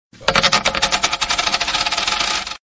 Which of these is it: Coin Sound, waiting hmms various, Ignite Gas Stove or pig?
Coin Sound